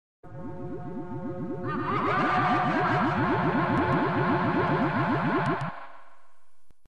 cross stitch; cave

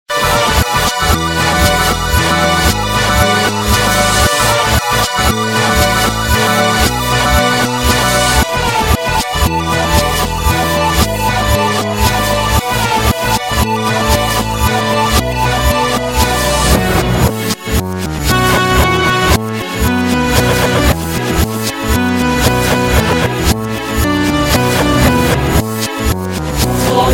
victory; reverse

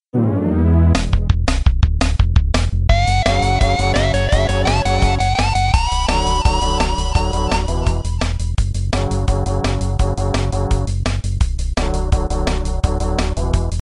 stage clear; dixie

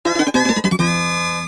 horse race

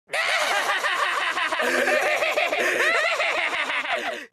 laugh

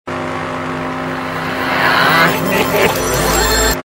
thinking time; reverse

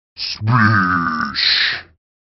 sploosh

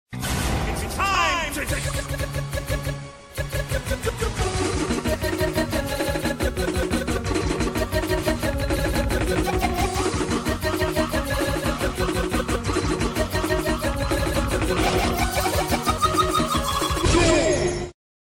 duel; cave

